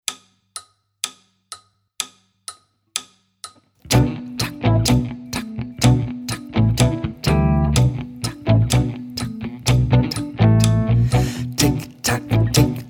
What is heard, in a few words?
tick tack